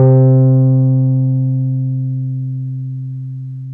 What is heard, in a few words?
Wurly, Keyboards of The 60's and 70's